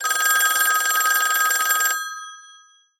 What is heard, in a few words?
phone